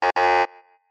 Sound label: error; Mystical Ninja Starring Goemon